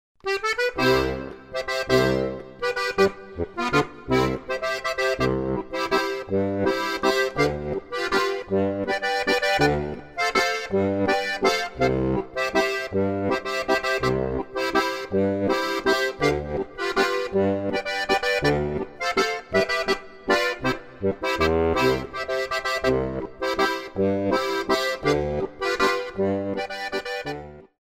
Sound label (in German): Plattler